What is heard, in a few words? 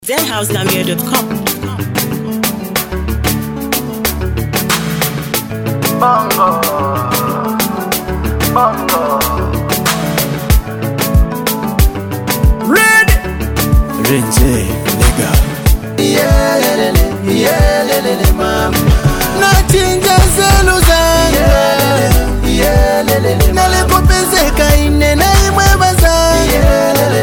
Bongo Fleva